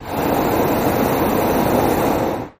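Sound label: Hot Air Bllon Burn Me, Hot Air Balloon Burner; Medium On, Weird Off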